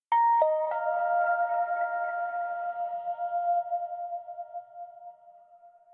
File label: Tension Fill